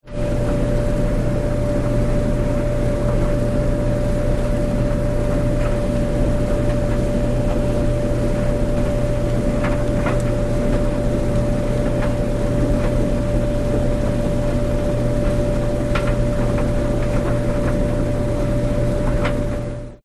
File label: Dryer